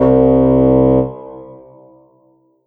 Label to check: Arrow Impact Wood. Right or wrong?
Wrong